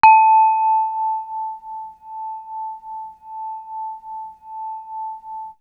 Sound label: Vibe; Coldcut's Kleptomania VOL1